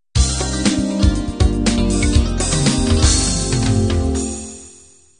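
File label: standard 16 beat intro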